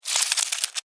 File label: Cockroach Run, Cockroach, Run, Scurry, Movement, Dirt, Insect, Bug; Cockroaches; Animals:Insects; Blastwave